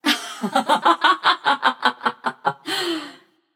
laugh passion; chaac

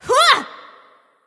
grunt attack; smite; sol

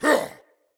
attack grunt; smite; odin

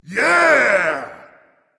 yay berserker; cuchulainn

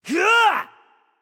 battle cry; smite; loki

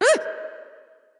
nv breath; smite; awilix

